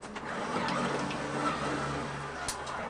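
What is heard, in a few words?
front door close; volvo 9900; Freyfurt Beta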